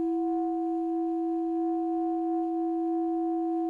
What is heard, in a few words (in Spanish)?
Multiarmonicos